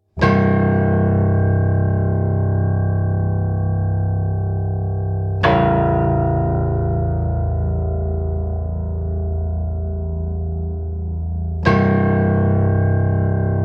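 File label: piano low notes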